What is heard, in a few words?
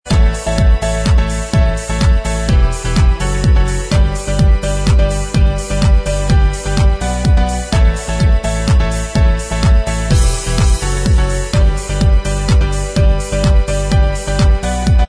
remix Inst